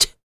FEM PERC, Vocal Jazz FX

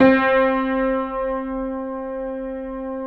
Keyboards Acoustic, C3; USB Soundscan vol.17; AKAI

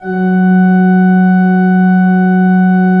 F2, Cathedral Organ; Propeller Island

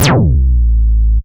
FX, Moog Minimoog

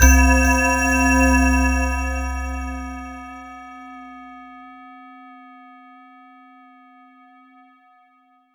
C1, Roland JV 2080, JUNGLE BRASS

